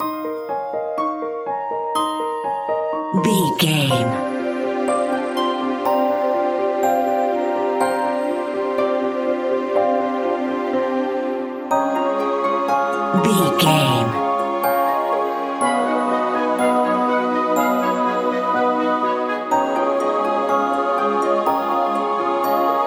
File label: enchanted magical forest